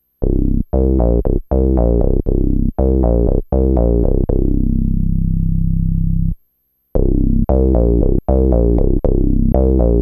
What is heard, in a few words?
101 nasal; strobe